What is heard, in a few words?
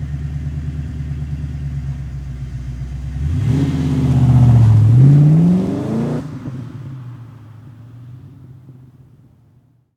Mustang Takeoff; Automobiles